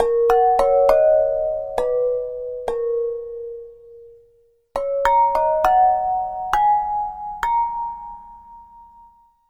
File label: Flower Pot; Ambient; Melodic; Ambient-Melodics